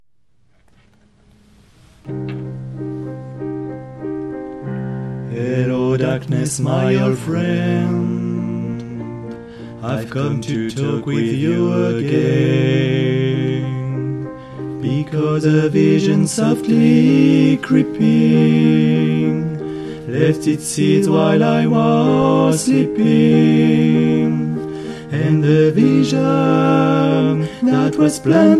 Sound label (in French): TUTTI